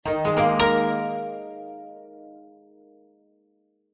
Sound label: multimedia game sound japanese plucked positive tone musical